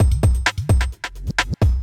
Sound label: electro kit 130